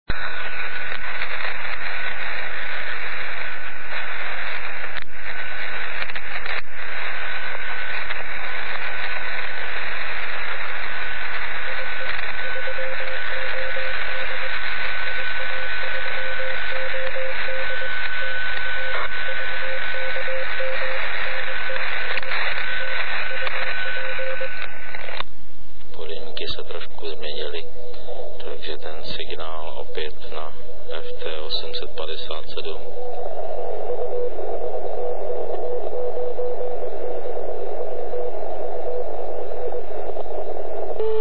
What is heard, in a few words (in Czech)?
FT817 SSB Filtr; ok1if